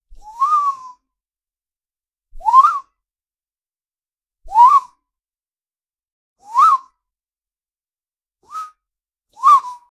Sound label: tiny woof small whimper, tail wag